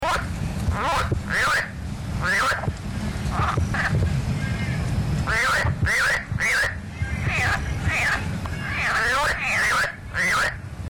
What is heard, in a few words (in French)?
bavardage